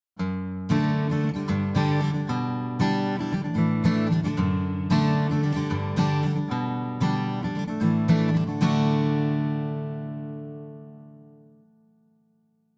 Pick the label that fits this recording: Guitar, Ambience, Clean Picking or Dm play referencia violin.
Guitar